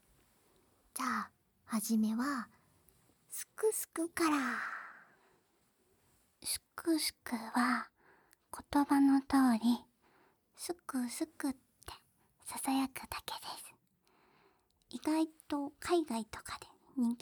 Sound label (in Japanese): 二人ASMR; ASMR抜粋